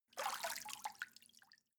step water sneak out

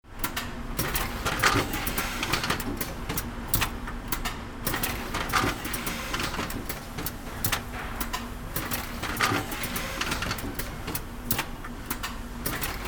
paint mixing machine

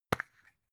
plastic spray can small open clatter

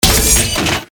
crash light metal

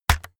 keyboard external key hit fast

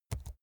keyboard laptop key press slow soft

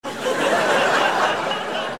laugh

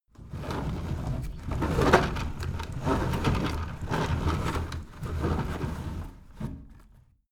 window roller shutter close medium

